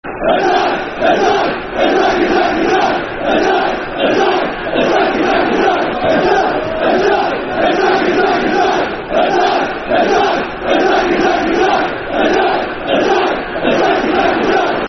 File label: Attack, Attack, Attack Football Chant (Soccer Song); Man United